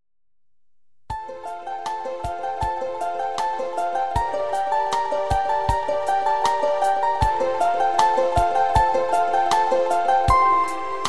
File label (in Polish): piosenka instr